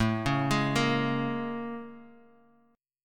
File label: Bb Minor 7th A; Bbm7/A arpeggiated